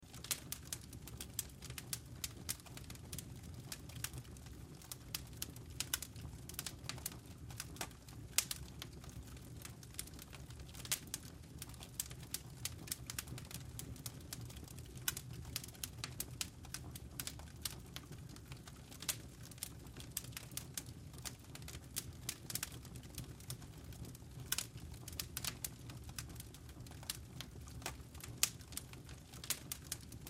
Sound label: Fire Flame Crackle, Fire Flame Crackle Loop; Fire Blaze Wood Heavy Flame Crackle Loop